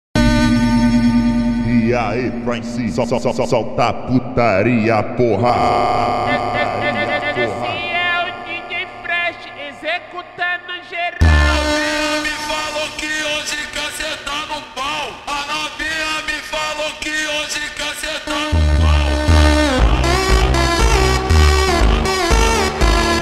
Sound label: slowed